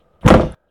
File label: Sfx Single Door Bang